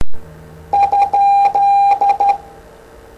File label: Morse